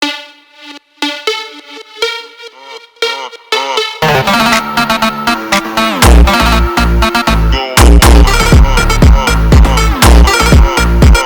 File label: brazilian phonk